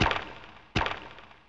Skeleton Walk; Seer; GamigoNA

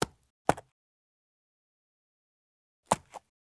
Pegasus Footstep

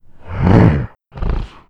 Angry Yeti Stand

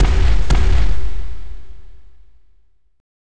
Robo Walk; Seer; GamigoNA

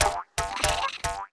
Monkey Walk; Seer; GamigoNA

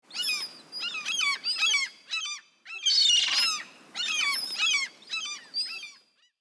Sea Gull; Seer; GamigoNA

